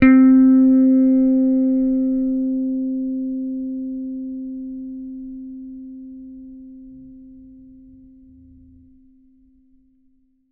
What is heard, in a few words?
T-BIRD BASS PICK C4; Bass Mace; DirectWave